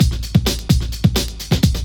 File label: drum loops; bb drum loops